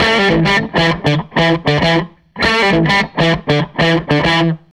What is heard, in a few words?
SG Guitar Loop